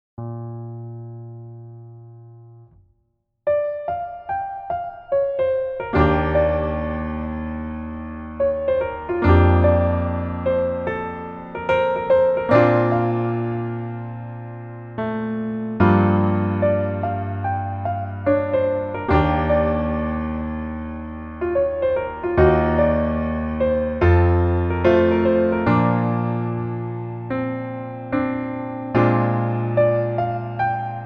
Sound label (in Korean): Piano ver 멜로디MR